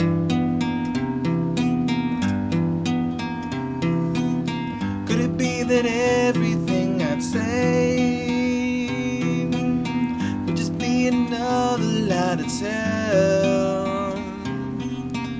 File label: dissonant melody